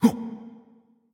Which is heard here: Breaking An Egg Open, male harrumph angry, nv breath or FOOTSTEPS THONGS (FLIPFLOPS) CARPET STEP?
nv breath